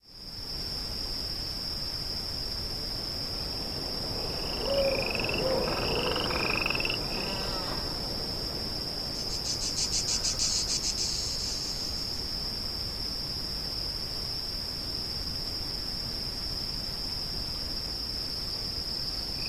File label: ambient plateau; episode 3 levels